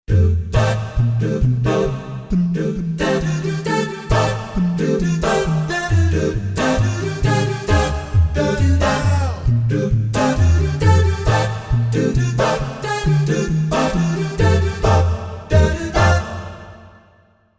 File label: vocal; Multi-patch demo 1; xp-80; roland demo cd